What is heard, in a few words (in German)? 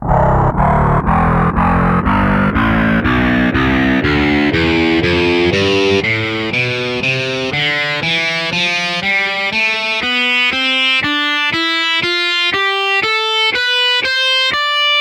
overdrive guitar tonleiter